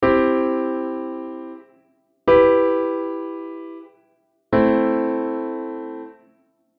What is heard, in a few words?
C Em Am